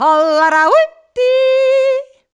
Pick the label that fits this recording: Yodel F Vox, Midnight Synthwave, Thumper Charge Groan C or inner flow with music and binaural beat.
Yodel F Vox